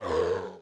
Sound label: Knights Templar Gurgles; Playable Tutorial Level